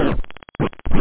transit, scratch; da capo vol1

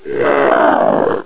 swoop big; Hexen II; imp

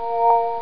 menu chime

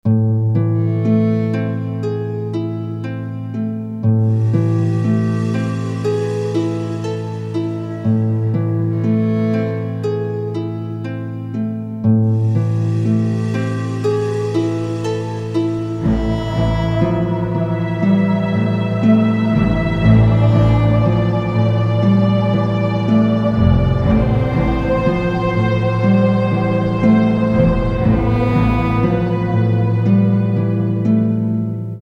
Conversation, Music; vampire demo

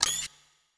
click; ImpGal2

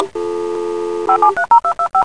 modem; maddenfull

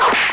snap!; charley cat mouster mind

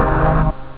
rave; da capo vol1; instruments

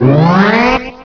Warning; Bedlam 2 1.1.1; Bedlam Sounds

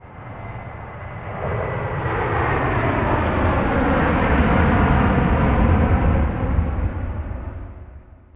aircraft; Ambience; Urban Chaos

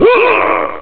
woo

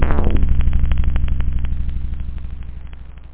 analog; da capo vol1; synth bass

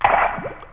splash; Hellboy

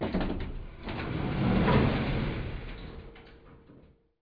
elevator doors; Moss; kiosk

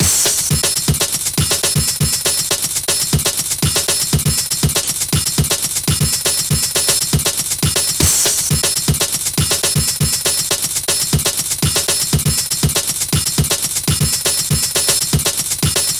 DRUMS, 120 BPM

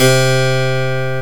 harpsichord, piano

